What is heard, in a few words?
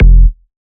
Bass F, Bass Synth